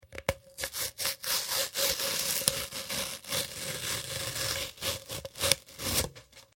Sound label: Paper Rip Foley Tear Cardboard; Materials; BBFX